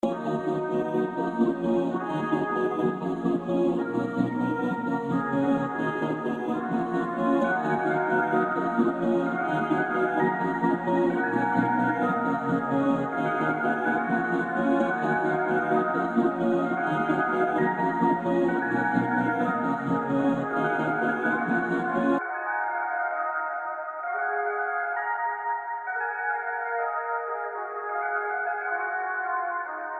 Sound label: Mater 130 Eb minor dark